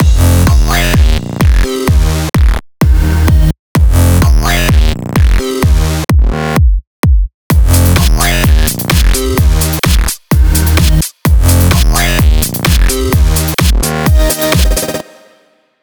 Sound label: 128BPM Mix; Vengeance Samples; Verve Kit